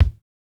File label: kick, house